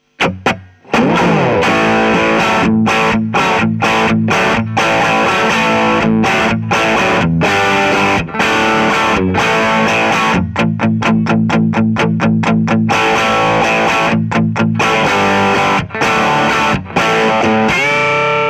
Crunch ES335 Mic; Bluetone

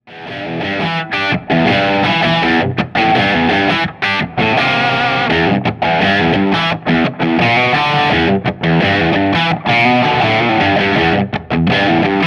Crunch Strat Boost on; Gain 12; Treble 12; PPIMV 17; VVR 17

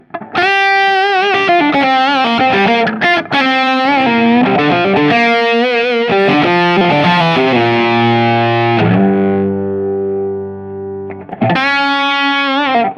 Lead Strat; Gain 12; Treble 12; PPIMV 17; VVR 17

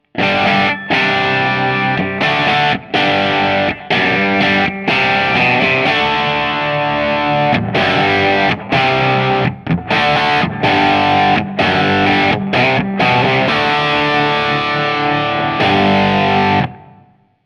vol pot; Gain 9; Boost on; Treble 12; PPIMV 17; VVR 17

